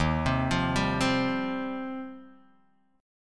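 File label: E flat 6th Add 9th E flat, down, Guitar, DADGAD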